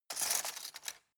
cutlery plastic multiple drawer grab

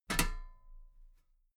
metal ladder step regular clank

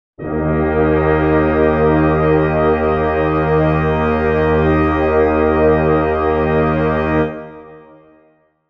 brass chord d sharp major

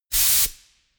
pneumatic pump

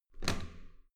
metal door heavy handle up hard

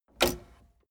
tape deck open slide

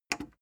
plastic button small simple click fast

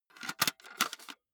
cassette into walkman

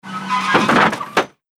cutting machine

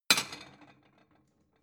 plate small shelve wiggle

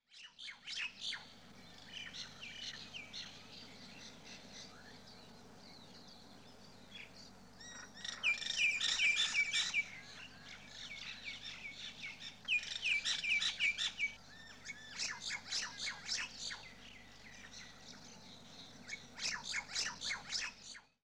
rain forest birds

